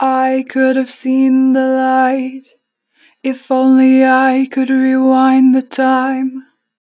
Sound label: Harmor Vocals, 4 bar vib; Rewind